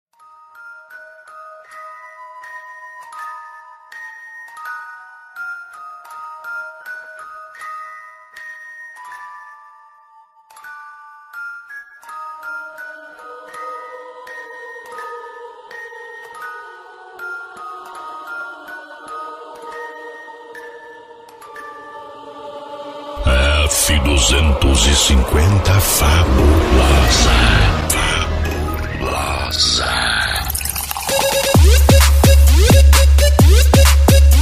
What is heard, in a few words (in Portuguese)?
abertura f250 fabulosa especial funk bass